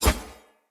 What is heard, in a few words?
Short Sword Whoosh; Weapons; Player